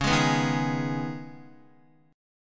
Db 7th Suspended 2nd Db, down, Guitar; JGuitar

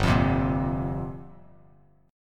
G Minor 11th, Bass Standard; Gm11 strummed; JGuitar

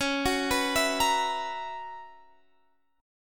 B Major 7th Suspended 2nd Suspended 4th Db

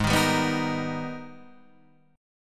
G sharp Minor Major 9th; G#mM9 Chord {4 6 5 4 4 6} strummed

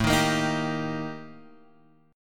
Eb Major A; strummed; JGuitar

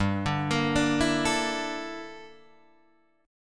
G Minor 6th Add 9th G down Guitar Open D

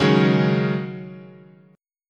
Db Suspended 2nd Suspended 4th, chord

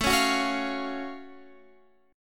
Gb Minor Major 7th A

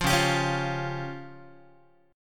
Db Major Flat 5th, Guitar; strummed; JGuitar

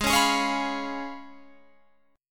Db 7th Ab, guitar

